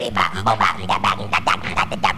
Beatbox, Drum loops

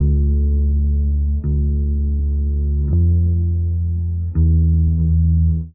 bass high noon, Loops, Sad

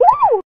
"woo", voice

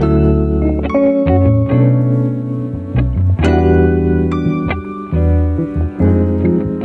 guitar

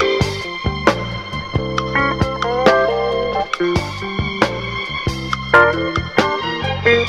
strings; Phonky

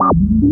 circuit; Zaps and Beeps

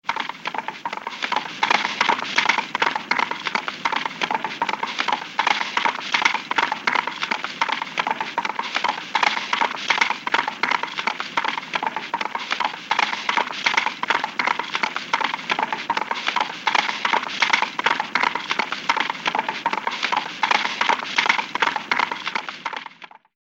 horse gallop; Animals

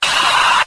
"ah" exhale

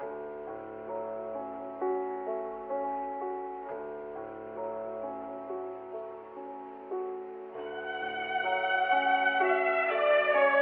strings, sad loop; Loops

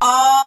"ah", male; Voice; ooh ahh

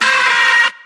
"ah" scratch; ooh ahh

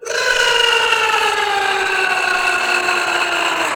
scream halloweenish; ooh ahh

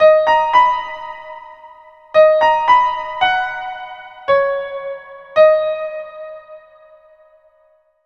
piano, sad loop

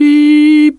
beep

